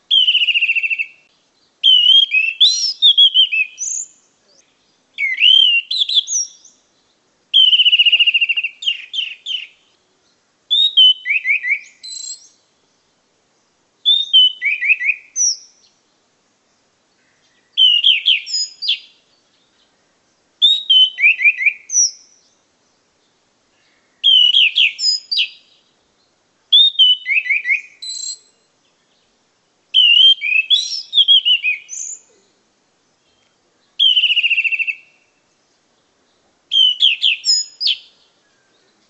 anis cendana

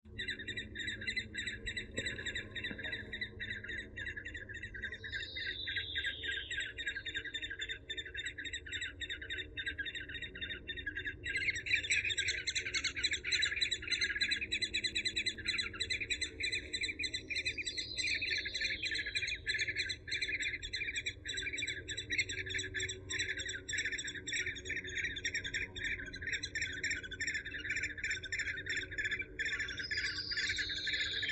suara alap walet

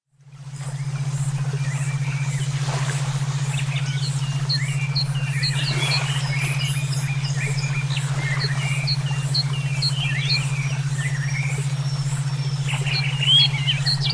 terapi brainwave

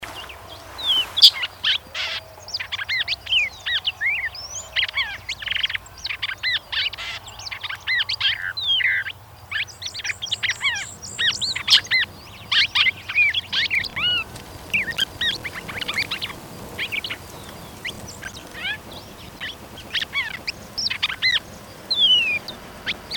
suara angry bird red crested cardinal; jeremy minns

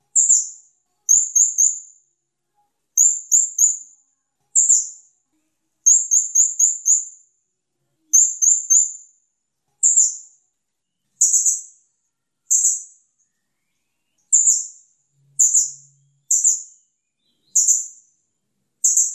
suara serindit

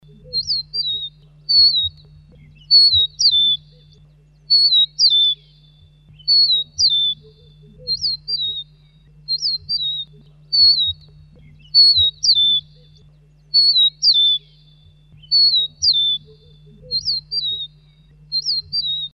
suara rufousnaped

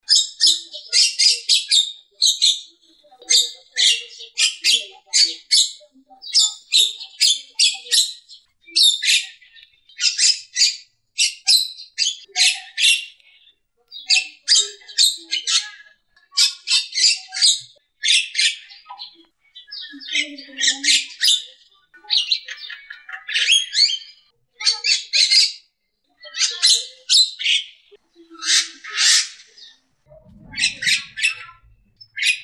suara jalak kerbau

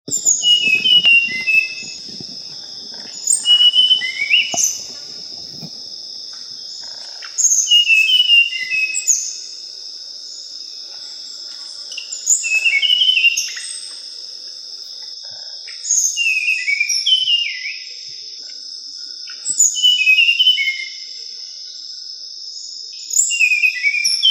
suara sikatan bakau sulawesi

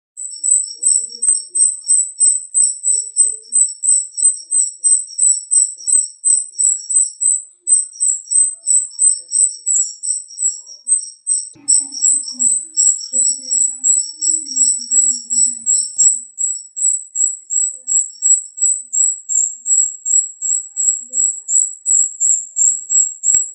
jalak rio masteran

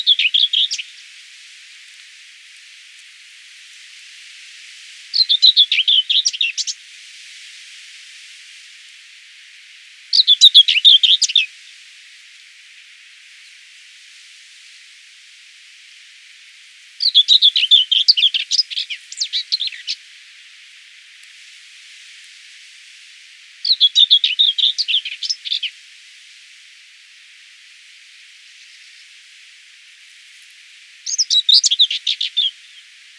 whitethroat varian

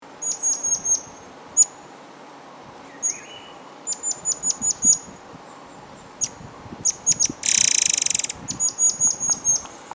burung murai besi murai cai murai air mancur

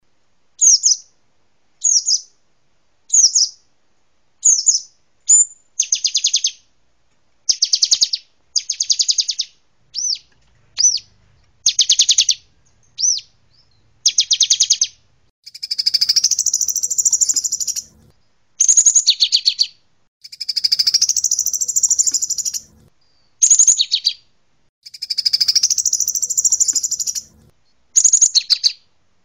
kombinasi glatik batu dan kolibri

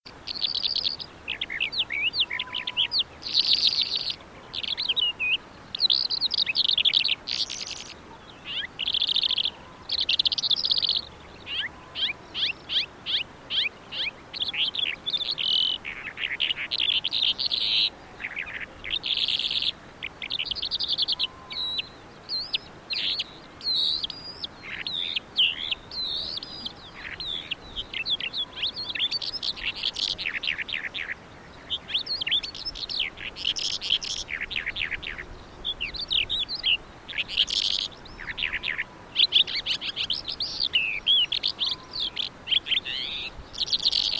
suara kicauan calandra lark

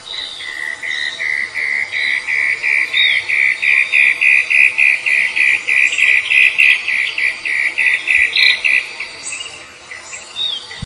cica matahari

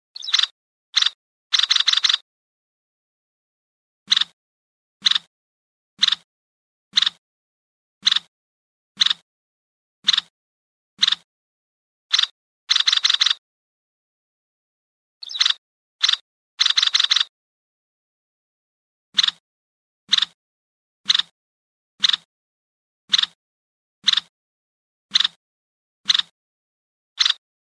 suara panggilan burung red necked phalarope

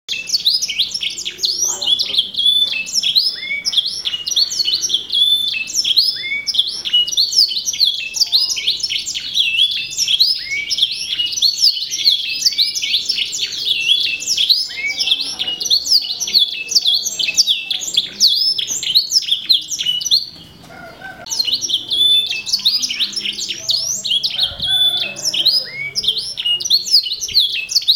kenari tazmania

